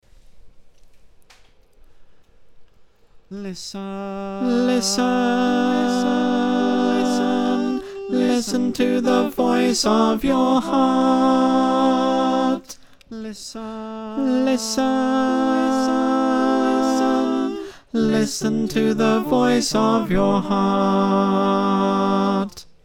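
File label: tenor, choir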